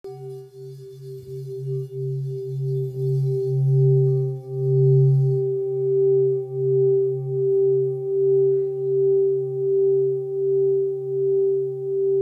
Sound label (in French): Bol full moon do